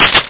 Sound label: Rem key; Noisome